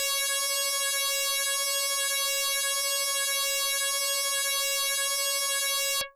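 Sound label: Electric Bass DX100 C#1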